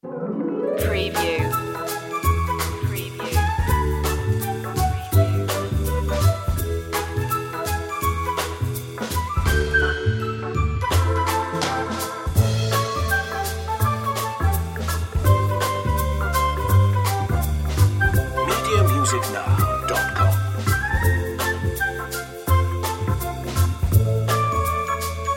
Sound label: retro showroom